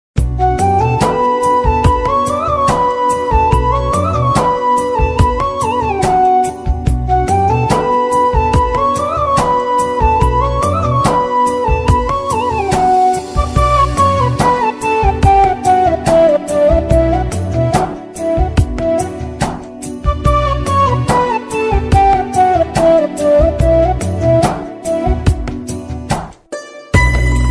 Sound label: slowed reverb lofi ringtone